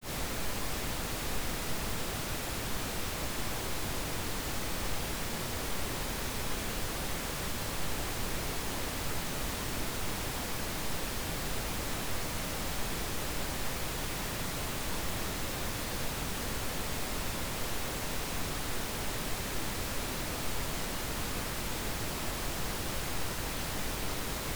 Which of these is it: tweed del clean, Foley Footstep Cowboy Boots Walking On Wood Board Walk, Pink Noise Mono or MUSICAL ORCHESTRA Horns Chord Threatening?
Pink Noise Mono